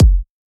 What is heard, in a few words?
EDM Future Kick